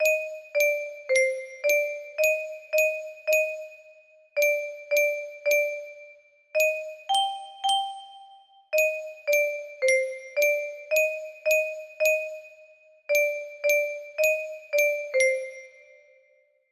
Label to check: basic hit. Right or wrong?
Wrong